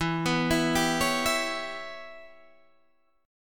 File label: Db Minor 7th Flat 5th E, chord